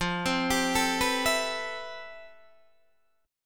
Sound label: F Major Sharp 11th Guitar DADGAD, chord